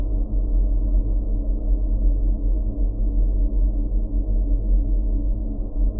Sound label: InfiniVerb Voice, sparse soundscape Loops